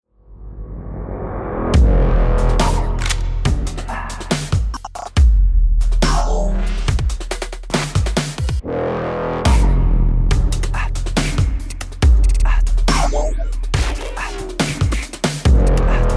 start round, music

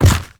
step soft, zombie blind; Half Life Alyx; creatures

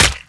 bullet damage npc

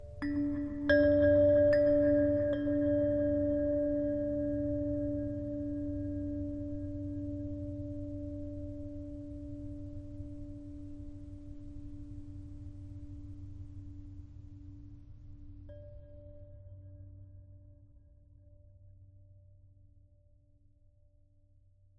ambient abstract chimes low; Half Life Model Pack

